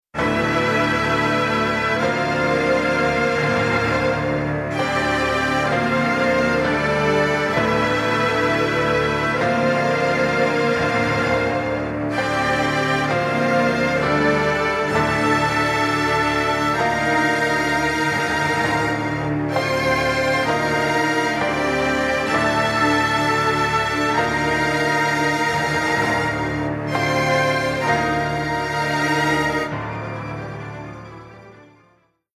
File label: Strings Piano On